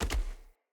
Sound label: Battle Dog Land Veg, Footsteps; Snc Battle Dog; Foley